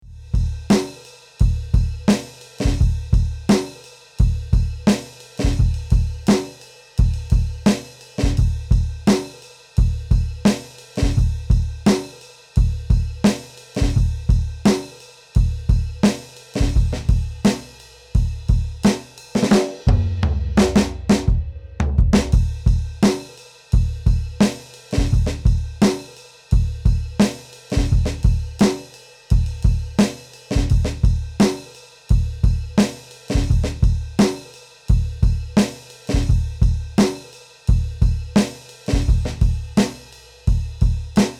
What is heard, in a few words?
solo drums; rin-tin-tin